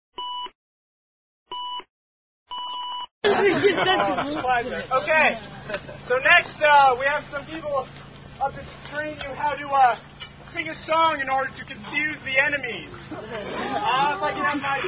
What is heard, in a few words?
Camp Fire, Clapping Game; Related To Korean Bunny Children's Game; Troop 390; Boom Chicka Boom